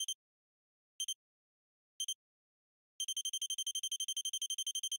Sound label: selftimer; FCNT Arrows Alpha